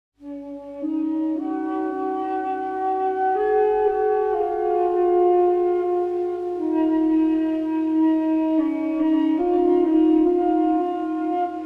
Flute Music

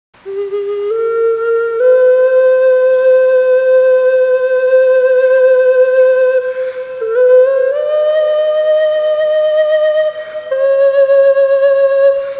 Indian Side Flute

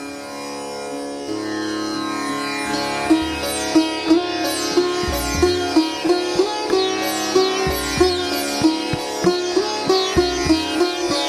sitar tampura tabla

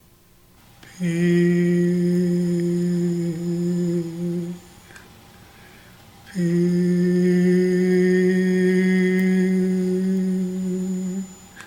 peace chant

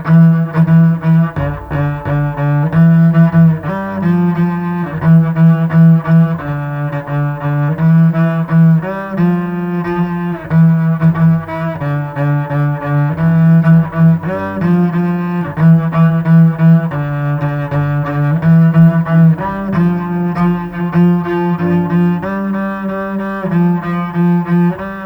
Cello

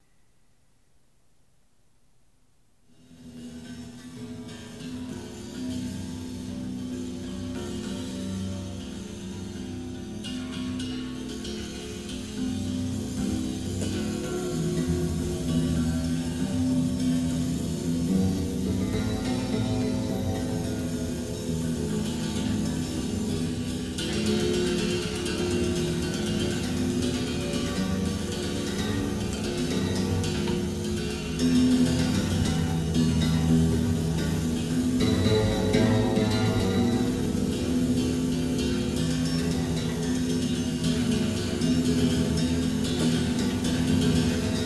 Bass Sitar

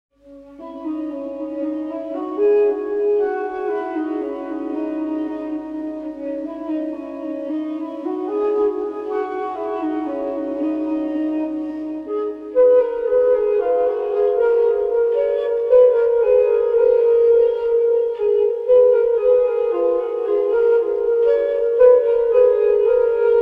Flute Music

